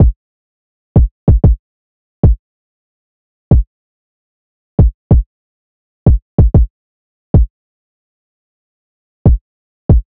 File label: Drum Loop 94 BPM Kick, Hip Hop; Cymatics; Los Perro